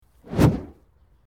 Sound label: Fire Whoosh Single; Short Fire Whoosh; Flames; Objects